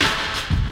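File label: hit left; Spectrasonics; Liquid Grooves 1 & 2; CAVERN SW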